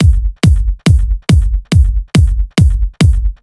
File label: Kick Loop, 140bpm